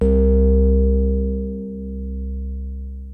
PPG C1, Synthesizer; Hollywood Edge; Giorgio Moroder Rare Synthesizer Collection; PPG.2.3

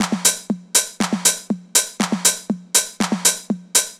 Combo Hat; Sonic Foundry (Sony Creative Software); Trance NRG VOL-2; Drums; Combo Hats

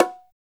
ETH BGO SLAP, BONGOS; Drums In Your FACE!

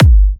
G, kicks; markus hakala kicks

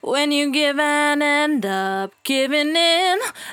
68bpm D, Velvet Vocal; Zero-G

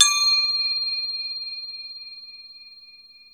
CROTALE D5

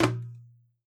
MIXED DRUMS

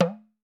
TAM TAM, African Drums

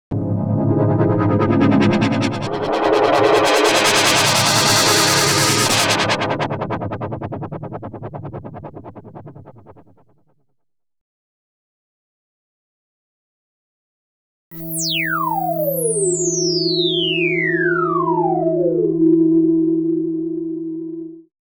PPG Effects; FX & Atmo; Klaus Schulze Collection

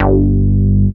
MOOG BASS G2; Best Service Dream Experience

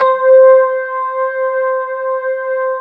FENDER C4 R, Keyboards Old School; USB Soundscan vol.09; AKAI

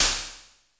FM SYN PERC; Crypton - DrumBase5000

